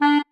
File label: marimba penguin; ゆめ2っき